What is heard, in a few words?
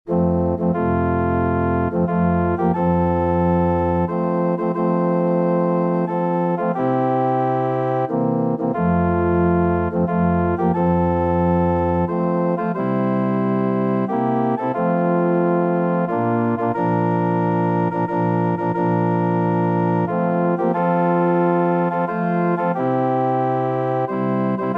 ORG, organ